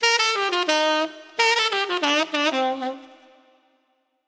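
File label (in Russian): мелодичный наигрыш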